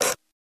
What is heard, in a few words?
Door Outer Damper, dat machine